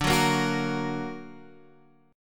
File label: D Augmented D